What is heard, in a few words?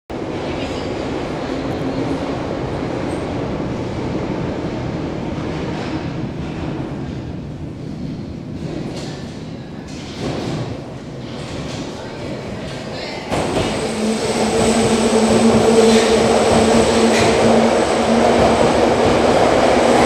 subwaystation london; best service Blue Box